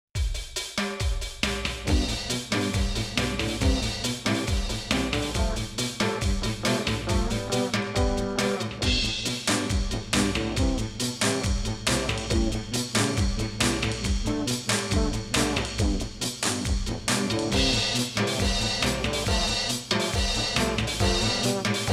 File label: 138 bpm